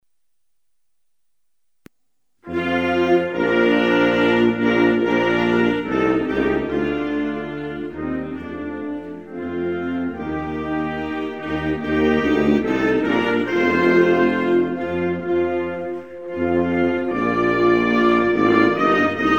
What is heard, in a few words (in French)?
Coree du nord, Hymnes nationaux